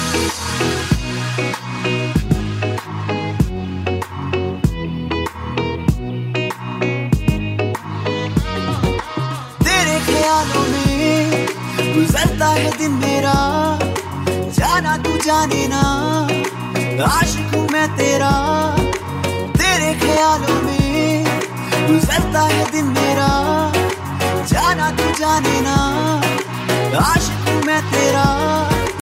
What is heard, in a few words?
bollywood music ringtone